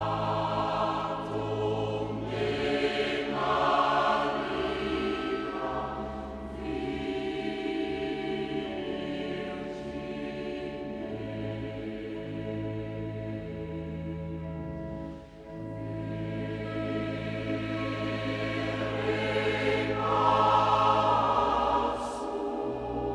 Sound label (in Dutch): Koor gemengd